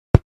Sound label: sofa